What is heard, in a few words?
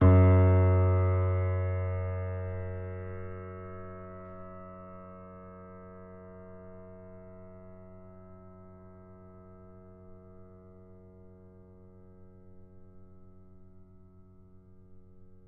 F sharp 5, piano